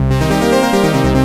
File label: Korg, FX